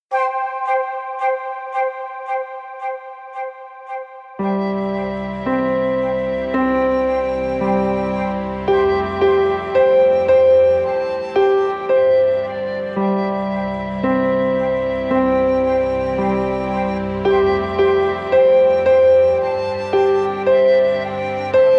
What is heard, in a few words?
Flute of the Andes Slow